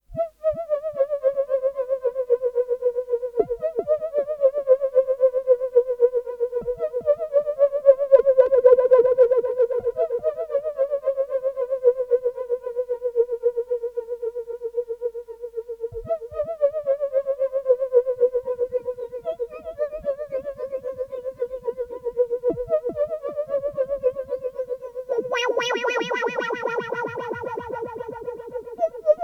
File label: electronic stingers synth